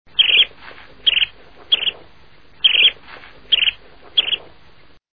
Curlew Sandpiper, songs

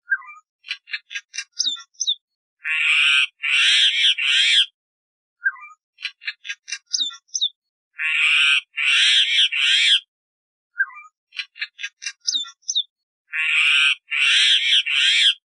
Common Myna, songs

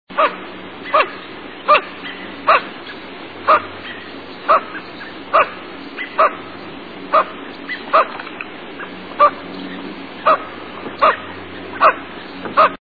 Lesser Scaup

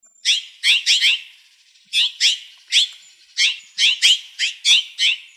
Mexican Jay

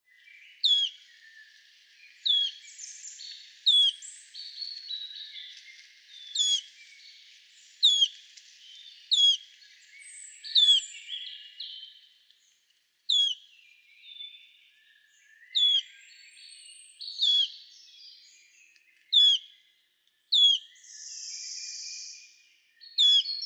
Wood Warbler

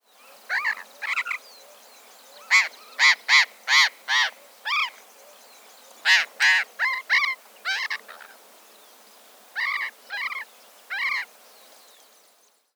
Burrowing Parrot

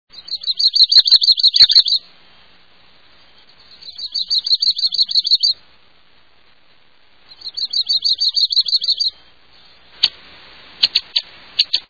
Yellow rumped Warbler

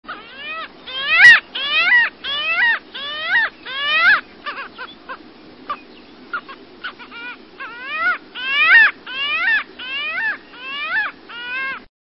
Parasitic Jaeger

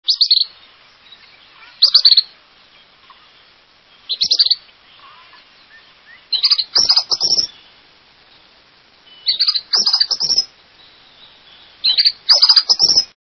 Tropical Kingbird, songs

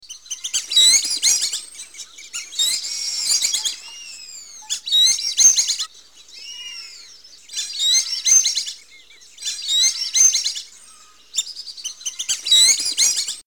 Black bellied Whistling Duck

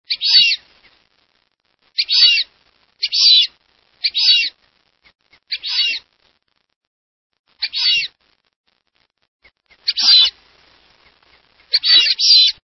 Fulvous Whistling Duck, songs

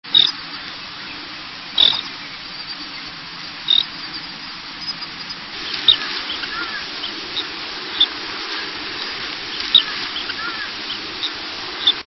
Snowy Plover

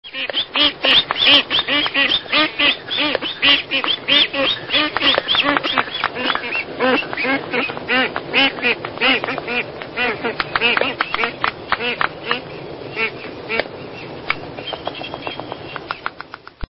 Black footed Albatross

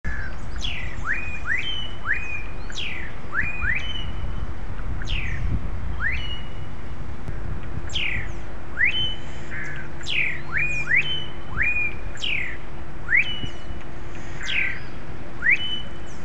Melodious Blackbird

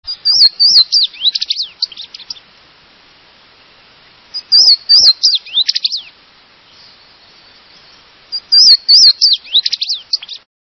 Louisiana Waterthrush

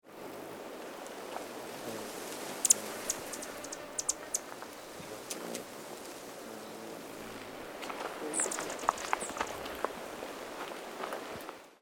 Bahama Woodstar

